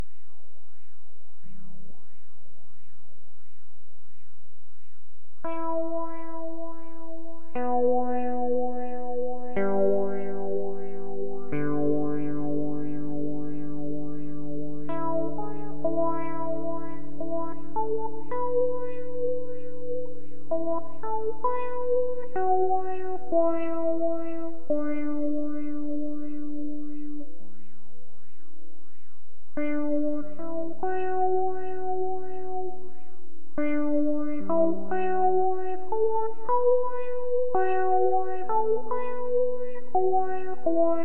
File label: scales effects